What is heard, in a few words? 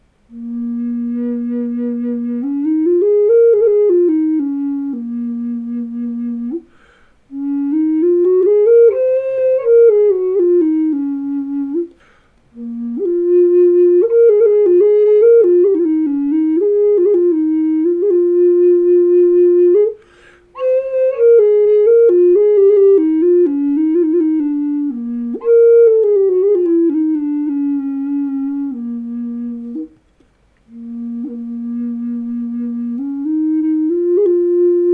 B3 a432 Mahogany, flutes; Ugly Boy Flutes